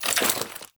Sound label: Generic Foley; Warframe; Warframes; Dagath